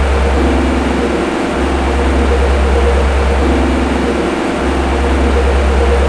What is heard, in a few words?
fuelpump